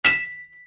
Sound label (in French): Marteau Clou; ecrins; Mars